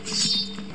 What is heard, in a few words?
sword